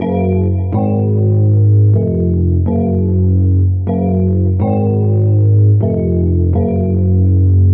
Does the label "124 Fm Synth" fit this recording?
Yes